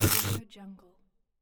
static electricity discharge sound

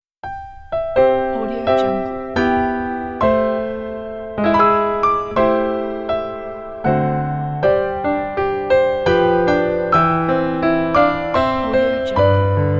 the star spangled banner piano version

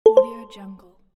button

